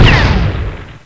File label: Exploding Bullet; Gunlok